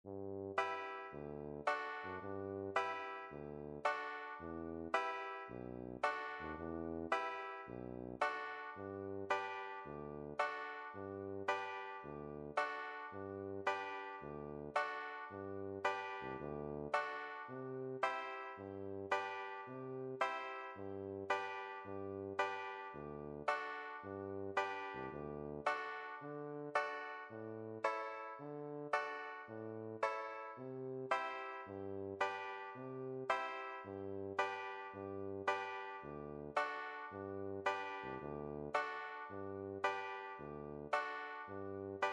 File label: Tuba and Banjo